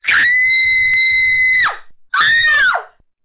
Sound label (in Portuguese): grito feminino